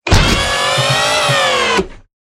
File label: Robotic Movement; SoundsCrate